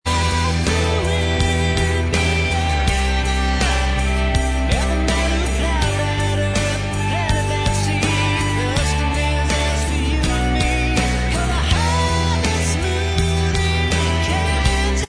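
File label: backing vocals, backing track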